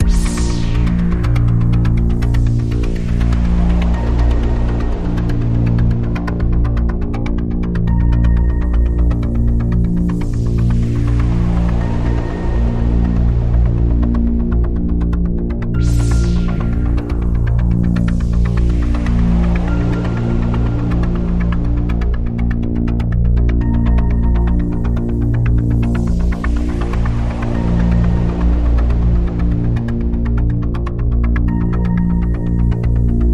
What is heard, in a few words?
Radio Toolkit Pad